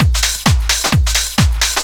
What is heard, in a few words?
groove, DRUMLOOPS